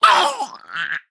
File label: Male Imp Death